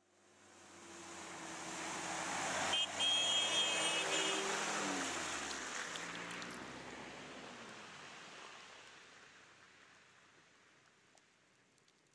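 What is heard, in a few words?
car splashed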